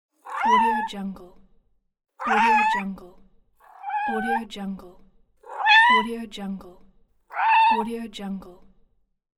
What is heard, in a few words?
hungry cat meowing